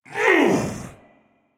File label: Grunt Physical; Assassin; Chained One; Realm Royale